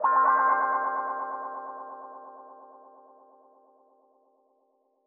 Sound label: Sky Guitar